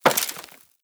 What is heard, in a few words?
debris wood, bullet impact debris